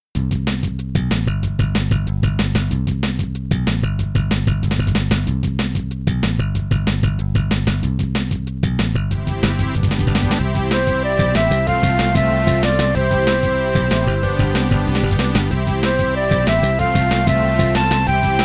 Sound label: End Tune; Glizda